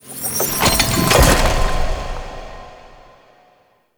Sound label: Bridge Form; Warframe; Ambience; Cephalon Weave